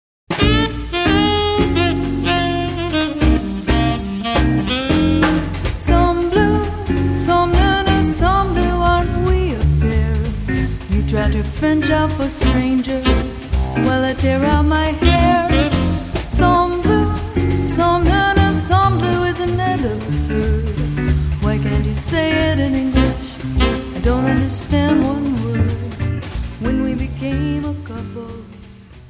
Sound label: blues